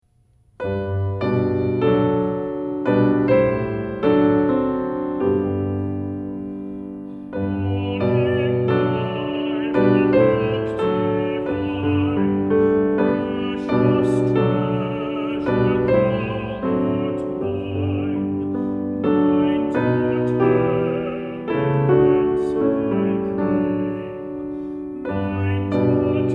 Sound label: Piano; Holy Bible Book Devine